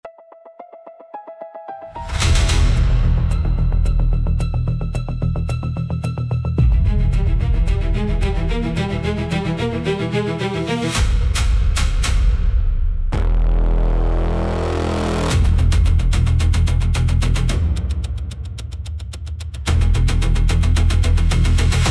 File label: action music; video reklama s pomoci ai